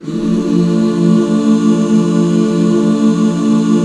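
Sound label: HAL D minor right, Back Chords; Sound & Vision - Gigapack II CD 1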